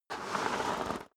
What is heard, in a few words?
step snow slide long